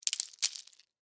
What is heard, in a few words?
Debris Lima Beans; Texture; Materials